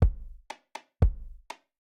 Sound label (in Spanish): Loncomeo 60 BPM